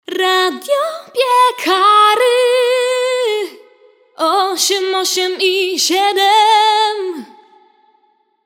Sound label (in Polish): jingiel; radio piekary wraz z rmf fm